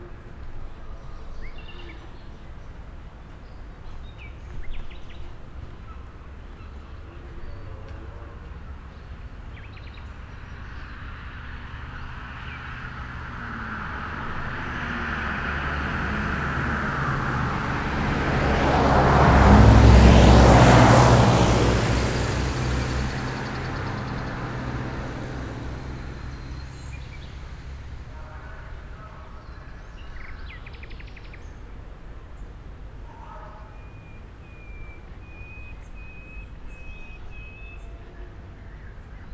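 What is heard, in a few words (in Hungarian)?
kettő fel, madárfütty, kanyar; szállástól keletre; Thassos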